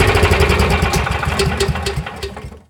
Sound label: generator stop